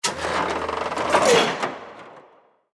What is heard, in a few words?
foot move; Liberty Prime